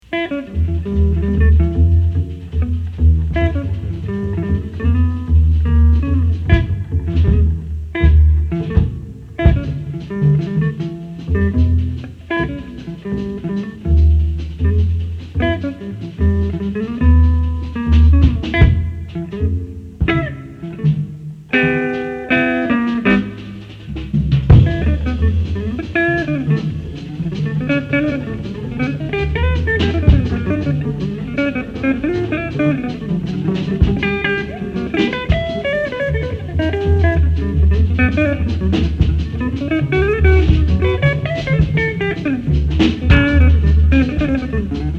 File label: Navy Jazz Trio